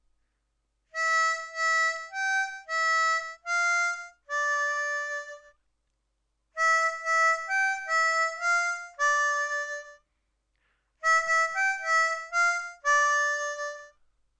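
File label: Chorus Fill, harmonica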